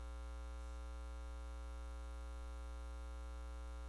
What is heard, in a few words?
kick